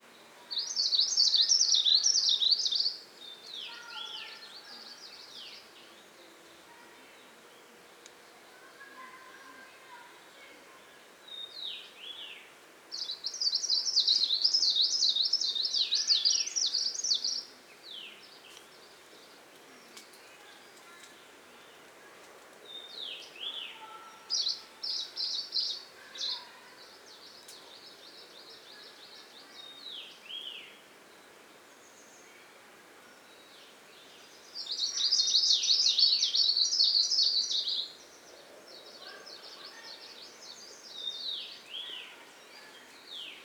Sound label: plumbeitarsus song